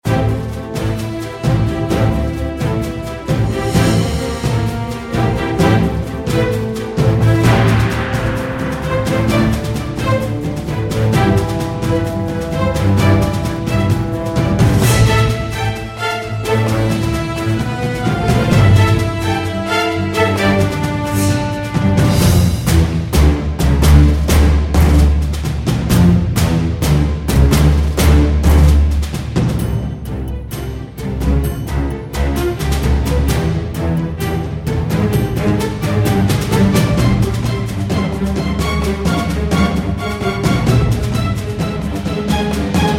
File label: music